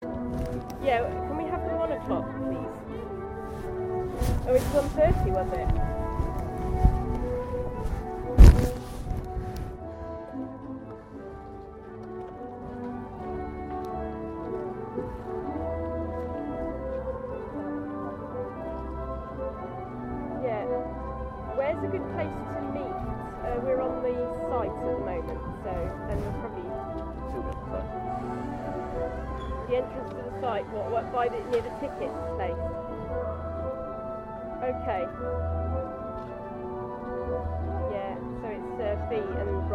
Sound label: music by ceilidh house while i arrange a taxi on Saturday evening